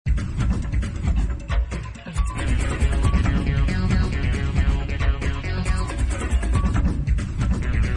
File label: Simple Ringtone; ringtones